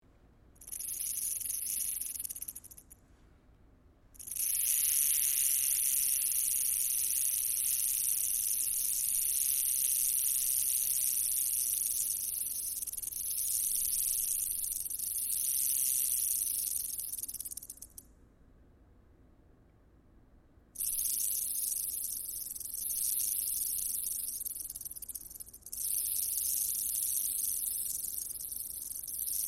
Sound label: banded rock rattlesnake